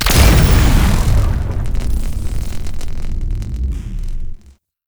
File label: signal source explosion; Mod Quest; Warframe; The Teacher